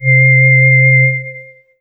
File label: M-Audio Venom Singee C3